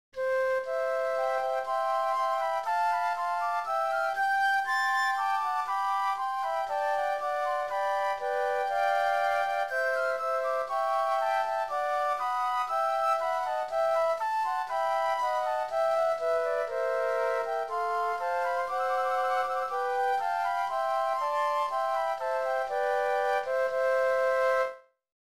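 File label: Hymn Flute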